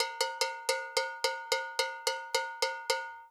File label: Bell, acoustic percussion loop; Drums & Percussion; Percussion Loops